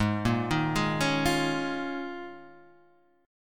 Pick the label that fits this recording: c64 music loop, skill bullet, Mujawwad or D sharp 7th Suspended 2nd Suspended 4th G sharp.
D sharp 7th Suspended 2nd Suspended 4th G sharp